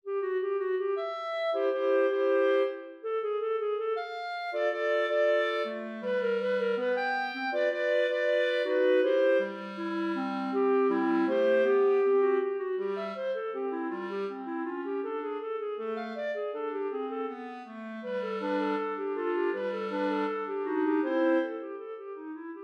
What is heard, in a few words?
Clarinet